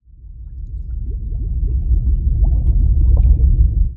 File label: Leviathan Rumble